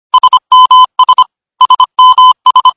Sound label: sms